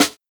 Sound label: clear snare D key, snare sound tuned to the D note. Loudest frequency: 3213Hz; snare drum samples; drum single hits one shots